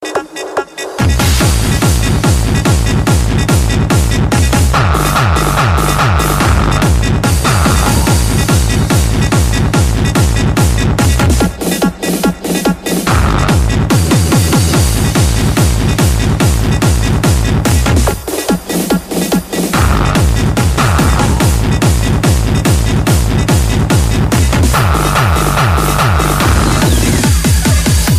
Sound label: Drum Beat Mix